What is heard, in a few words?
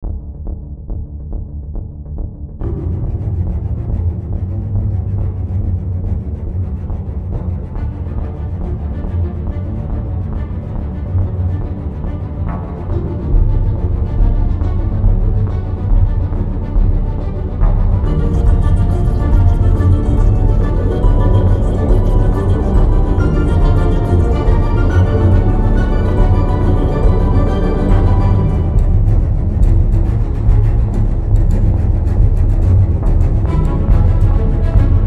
combat music